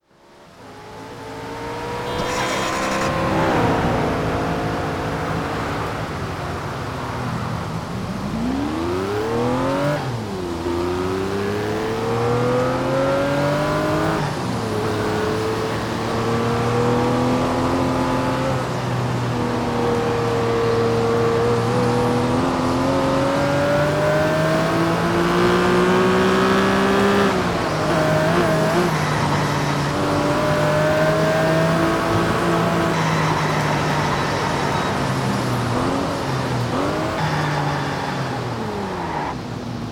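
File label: ruf rgt, car; TDU-1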